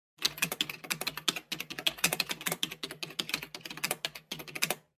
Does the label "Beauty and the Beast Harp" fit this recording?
No